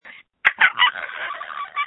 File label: screams